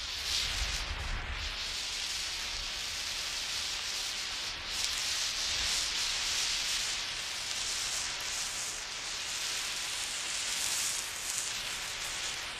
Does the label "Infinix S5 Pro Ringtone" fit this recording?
No